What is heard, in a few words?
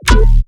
BASS ONE SHOT FLAM